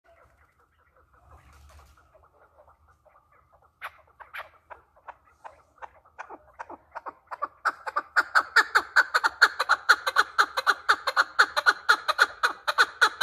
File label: chukar partridge male call; krasiviy golos kuropatki; muhtesem keklik se